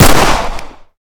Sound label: fire, glock; arccw ud